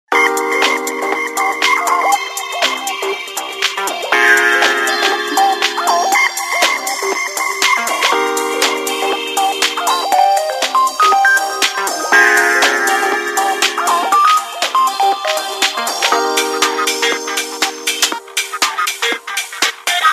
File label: Ringtone, Samsung GT-C3510